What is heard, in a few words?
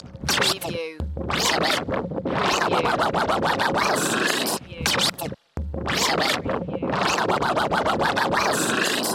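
Scratch Groove 105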